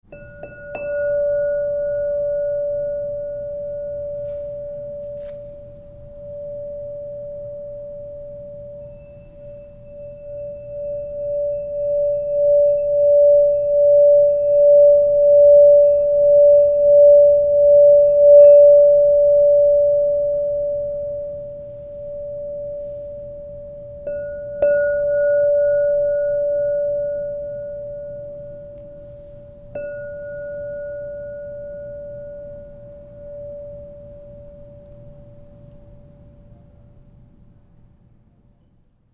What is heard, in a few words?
Crystal Tones Singing Bowls